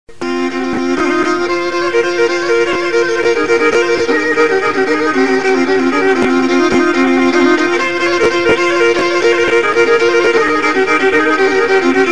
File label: kemence solo; karadeniz